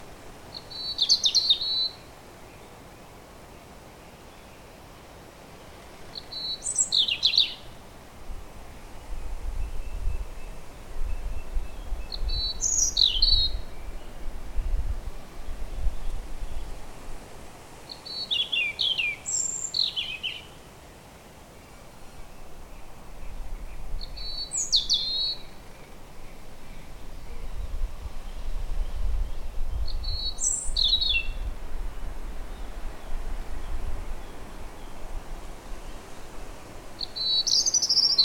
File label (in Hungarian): vorosbegy hangja